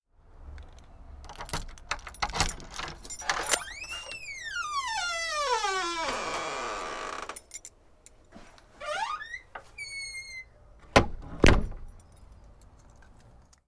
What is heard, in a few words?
Front Door Open Outside